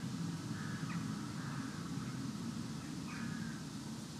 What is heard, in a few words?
Ambi Loop, outdoor samples Ambience Loops